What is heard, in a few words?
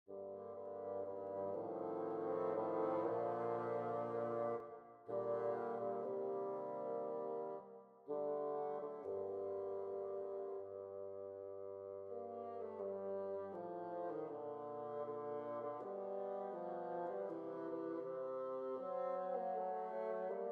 for bassoon quintet; Charpentier Marc-Antoine